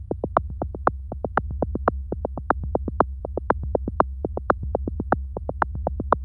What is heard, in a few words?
eurorack